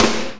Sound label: TURBO SN